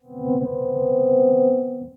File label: Piano & Keys; Miles of Mood